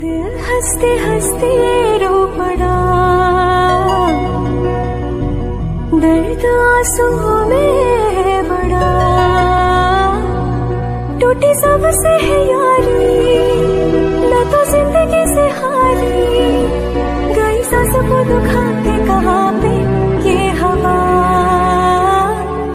song punjabi ringtone; bollywood ringtones

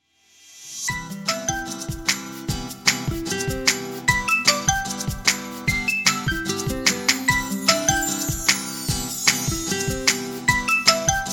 ringtone, cool ringtones

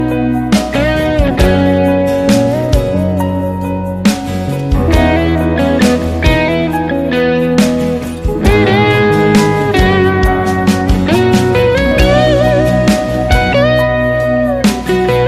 christian ringtone, christian gospel ringtones

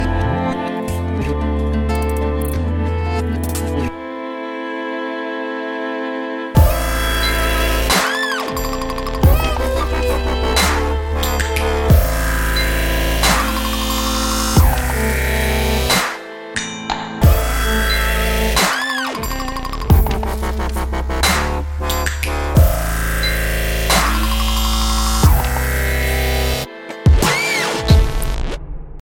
dubstep ringtone

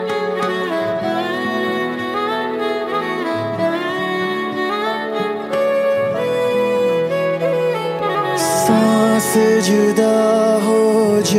new hindi song ringtone, bollywood ringtones

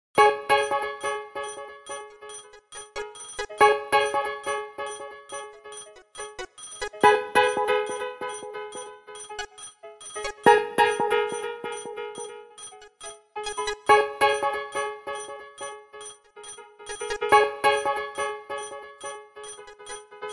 clubbin nokia 3120 ringtone

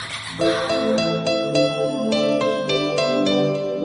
loud music message tone ringtone, sms ringtones; samsung sms ringtones

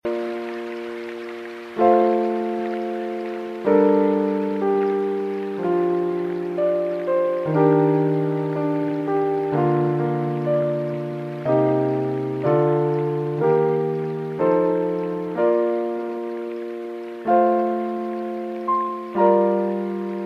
lovely cute musical tone ringtone

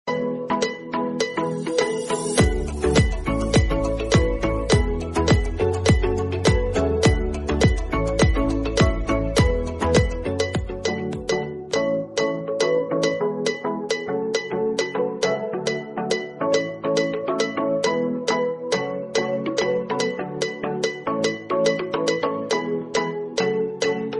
incoming call tone ringtone

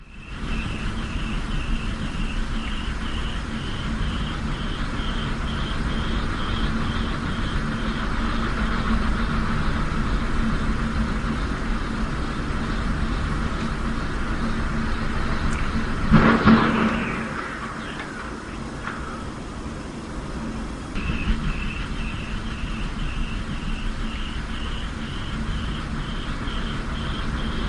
wind generator ringtone

